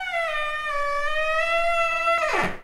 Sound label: door squeek; doorSounds